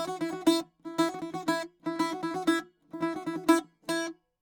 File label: bouzouki